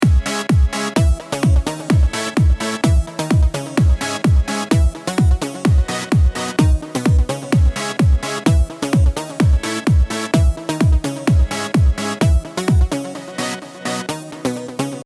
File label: echo ping pong; psp